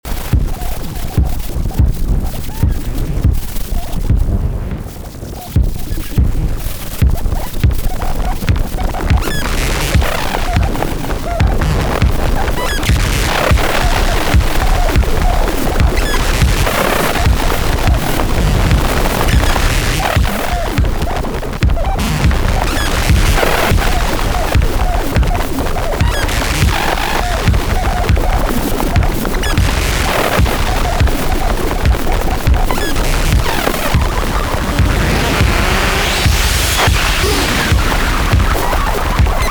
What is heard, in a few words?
tr707 via startstop